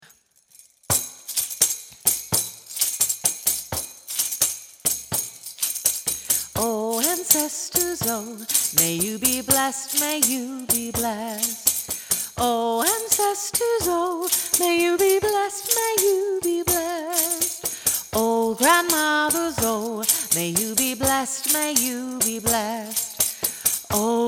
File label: ancestors chant